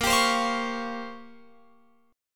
Bb Minor 6th Add 9th Bb down Guitar Open F; Bbm6add9 strummed

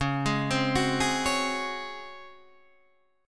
Db Major 7th Suspended 4th Db down; arpeggiated

